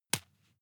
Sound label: juggle catch soft